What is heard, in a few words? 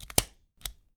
STAPLER